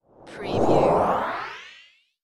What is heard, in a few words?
Scifi Production Element - Rise Up